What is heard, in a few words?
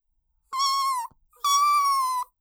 Screaming cat(頭を抱えて泣き叫ぶ子猫); 猫ミ〇ム音声だニャン！